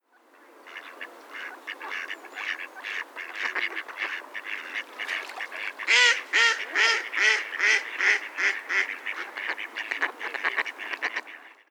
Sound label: mallard anas platyrhynchos